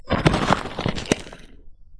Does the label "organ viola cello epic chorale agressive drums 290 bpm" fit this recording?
No